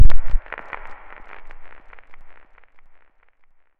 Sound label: Crackle